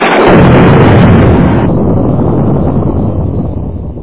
nuclear bomb explosion

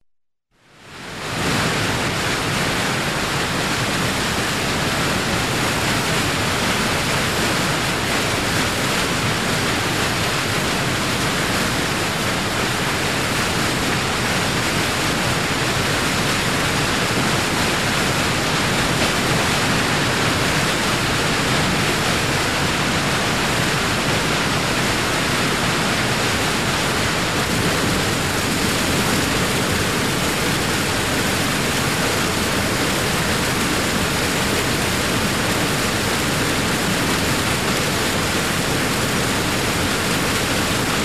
the sound of a waterfall a strong fall of water; shum vodopada

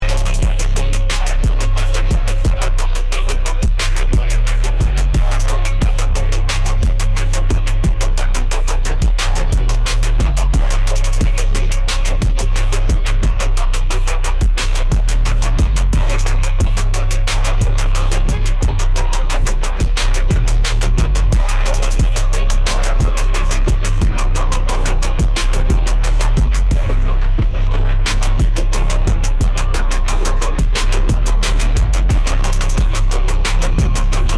shadowed streets, phonk

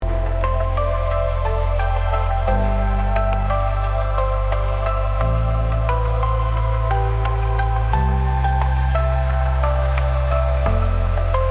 twilight harmonies; atmosfernaya

